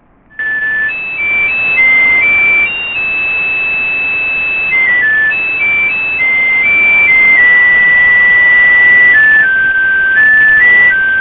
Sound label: pager